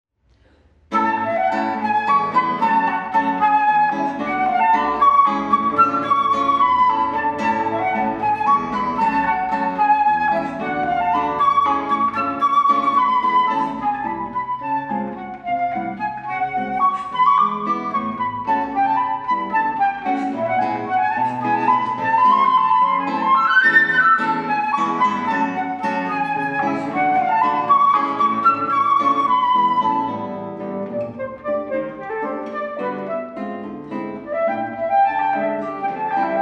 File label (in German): Spielmusik; Theodor Hlouschek; 3. Satz